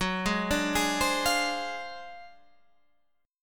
D 7th Sharp 9th Flat 5th F sharp, chord

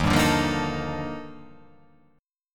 D sharp Major Sharp 11th D sharp, chord; x,6,5,7,5,5,5,6; chordshape

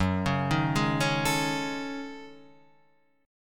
F sharp Minor 6th Add 9th

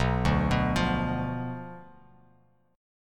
B Diminished B down Bass Standard

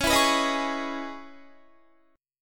Db Minor 9th Guitar; strummed; 21,19,21,20,20,20; 4E,4A,5D,5G,5C,6F

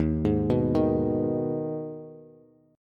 Eb Suspended 4th Sharp 5th Eb Bass; Ebsus4#5 arpeggiated; JGuitar

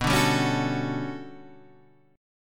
G Major Sharp 11th B, chord

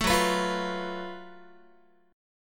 F sharp Minor Major 11th, Guitar; strummed; 12,14,14,15,15,x

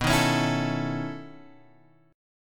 B 11th B, chord; B11 Chord {7 6 X 6 5 5} strummed

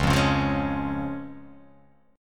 C sharp Major

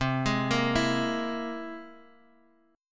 C Augmented 7th C down Guitar Open D

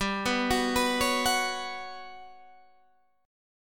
G Major 7th Flat 5th, Guitar Half Step Down

